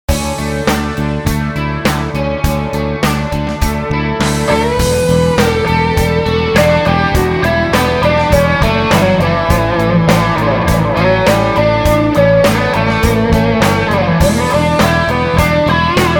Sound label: G12M Greenback 2x12